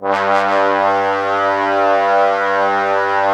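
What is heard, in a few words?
brass trombones G, Woodwind Brass Ensemble; 90 s Sample CDs; Miroslav Vitous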